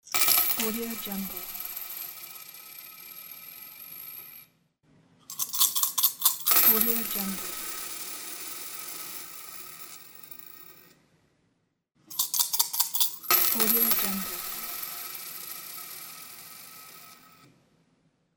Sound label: coins